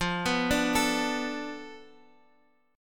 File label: F 6th Flat 5th F down Guitar Standard, chord; F6b5 arpeggiated